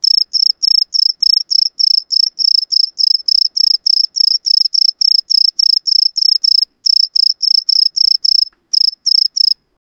crickets chirping

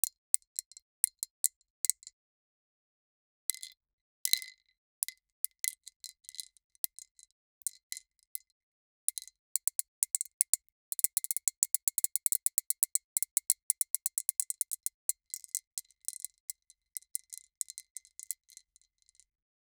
dozens of small gummy cub

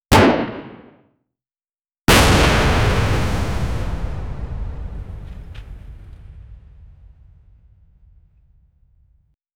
cartoon bomb hits the gro

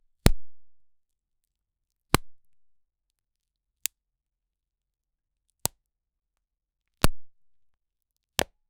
wet rubber breaking apart

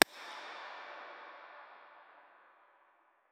corridor reverb echo

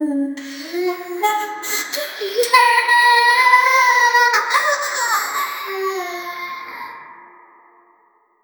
reverb memories ethereal

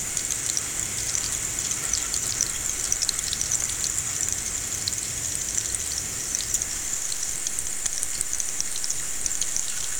a strong liquid streaming

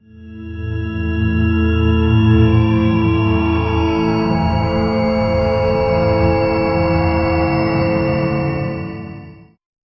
gradual tonal shift

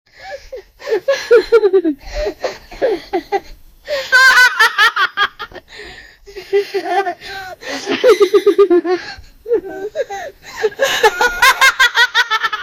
9 year old african, laughing

